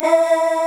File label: OOO A4; East West; Global Sounds